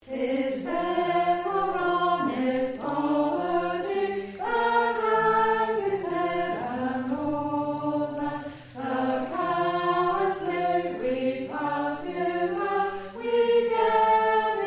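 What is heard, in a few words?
soprano, singers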